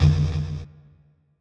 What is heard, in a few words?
Bomb Knock; Percs